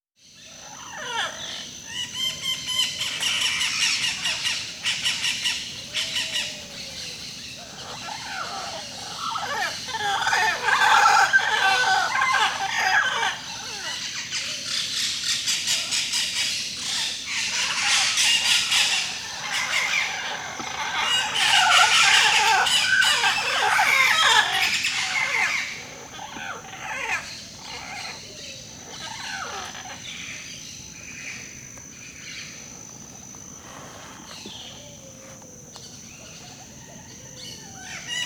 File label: Birds in Mexico